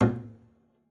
pizz noise mute a2, double bass

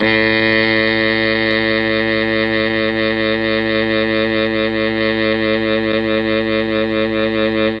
A1 MKII SAX, mellotron

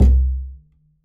perc, pizz; meatbass

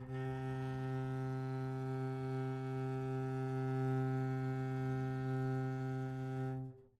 c3 down, bass arco; meatbass

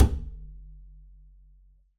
perc, pizz; meatbass

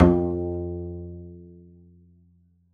pizz f2, double bass

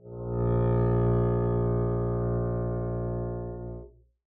arco bb1 mf down, double bass

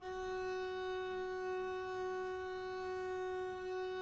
gb4 down, arco looped; meatbass

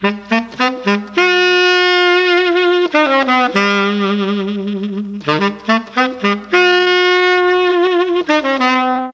D4 SAX, mellotron; FOXTROT+SAX

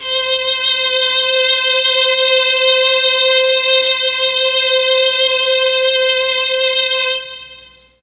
C3 3 violins, mellotron MKII

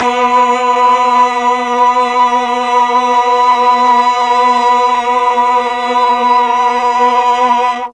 B3 8VOICE CH, mellotron; 8VOICE CHOIR

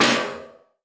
perc impact2; drums; one-shots; percussion

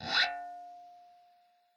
scrape, glowly bass

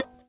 c5 release, glowlybass

